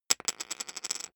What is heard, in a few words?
poker chip fall wobble